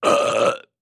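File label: Medium Gross Belch; belching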